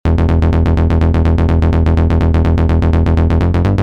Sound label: Bass Melodic Techno Vital